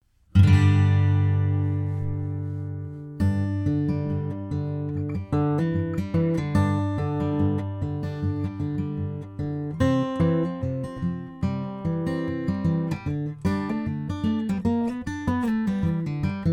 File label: Bourgeois Slope D